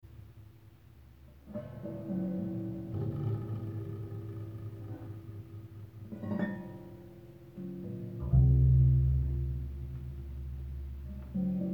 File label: Bells soft machine pulse end; pyykkipoika